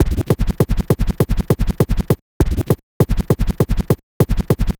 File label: Vinyl Scratch Kick